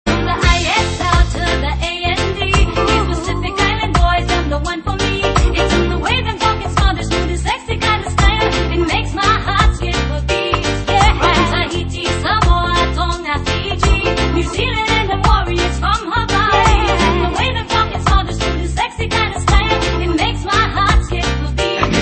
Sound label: rap